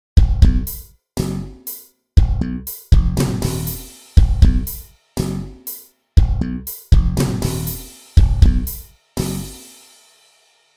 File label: drums bass